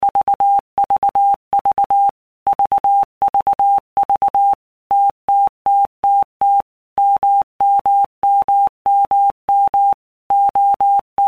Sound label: TMO0; 1-minute Blocks